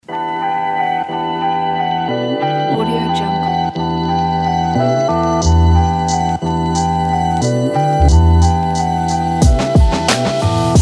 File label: lofi hiphop